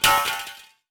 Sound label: IRONWARE, IRON WARE HIT; 1000fx